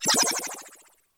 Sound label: zapper